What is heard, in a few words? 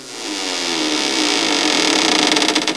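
attic door; HAUSHALT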